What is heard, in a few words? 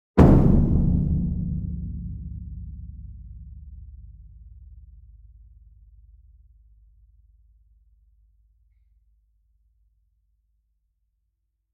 ENSEMBLE DRUM Bass Drum Concert Large Hit Medium; boom library; Cinematic Strikes Construction Kit